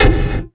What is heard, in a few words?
HIT DIST, Anime Sound FX, SCIFI